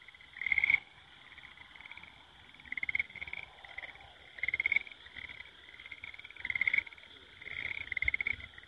frog

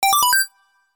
start sound

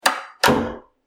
door close

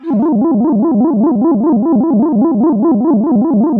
retro enemy; 【効果音】レトロな敵キャラ音（UFO）; レトロゲームの敵やらが歩く音やら飛んでいる音の効果音素材です。インベーダーゲームの UFO などシューティングゲームなどにも使えます。

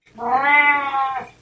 cat

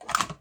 tape insert

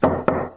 knock

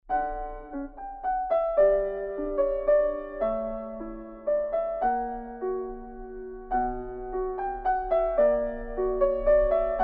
girl dream

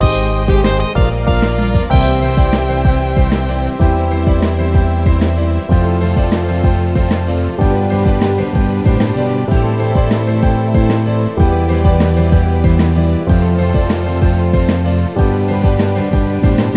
radio jingle; 【BGM】ラジオ番組のオープニングテーマ; 朝のラジオ番組がスタートする時のオープニング曲のようなループ曲です。

